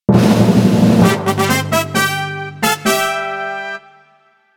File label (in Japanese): 昭和風 ジングル; ドラえもんがひみつ道具を出しそうなジングル